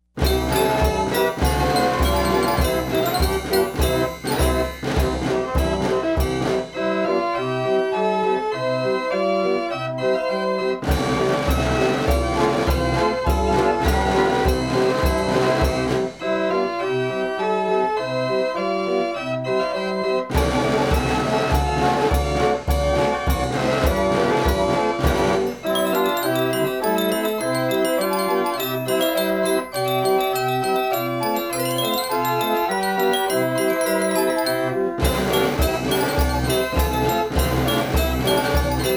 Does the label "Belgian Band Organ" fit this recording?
Yes